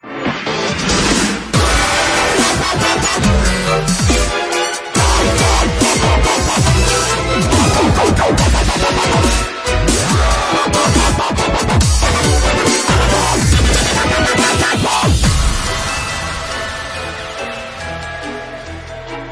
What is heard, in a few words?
nutcracker dubstep